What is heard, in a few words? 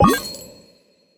play button choice; Rizline